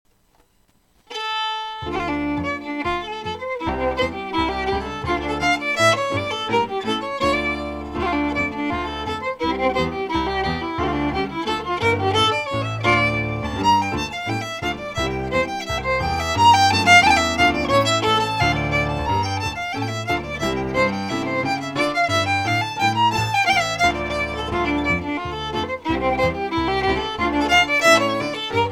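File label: Reel, Scottish Fiddling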